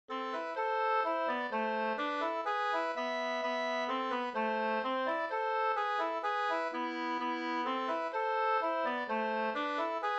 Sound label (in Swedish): ganglat Fabodlat; Lillhardal Hj